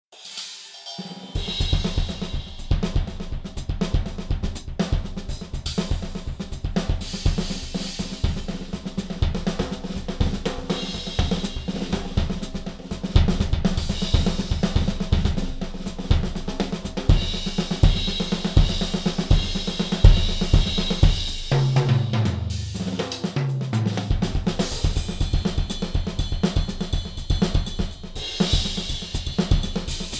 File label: ambient stick, drums only; tascam